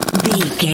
ui text type scroll effect

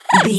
goblin fairy effort jump action

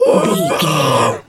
seal walrus death

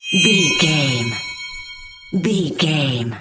magic shinny high tone

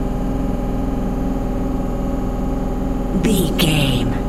background hum air fan device loop

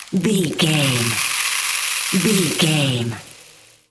dirt rice pouring gravel debris

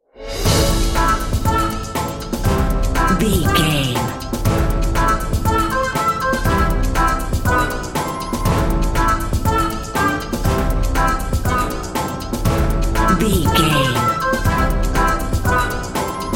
music comedy quirky funk knockout

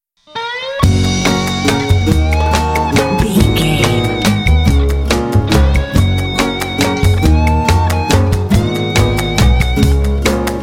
quirky tiny waltz

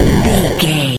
retro explosion deep

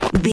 footstep dirt loose walk run

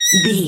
voice fun mouse

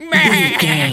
goat baa stressed hurt

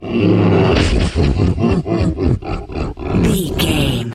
troll monster laugh

